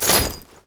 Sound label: ui store sell; LoL; hud